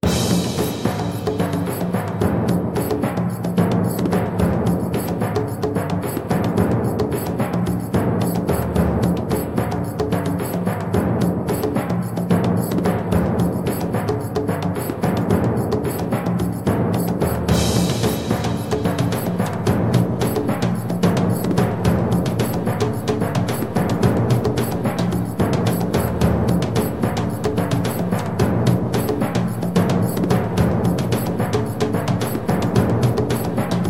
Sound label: Fight; Daemonica